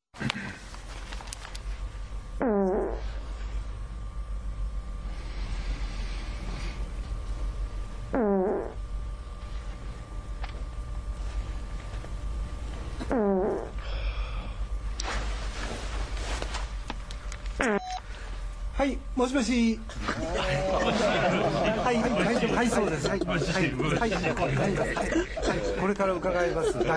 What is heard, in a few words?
japanese ringtones